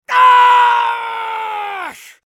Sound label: pain; bj blazkowicz; low health